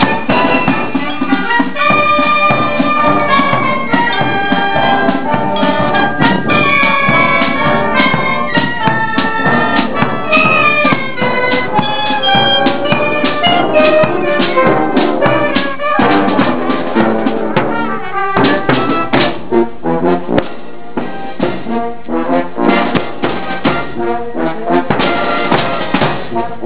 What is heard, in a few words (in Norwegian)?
korps; Strinda; Fallskjerm Grill